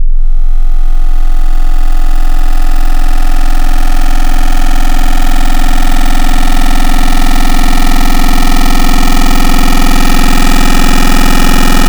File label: Sine to FM, FM Wavetables; Cymatics; FM Wavetables Vol 2; Serum